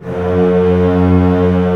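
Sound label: STR C1 FF, strings; Orchestra; STRNGS.C0-C6; Sound On Sound - Peter Siedleczek's Orchestra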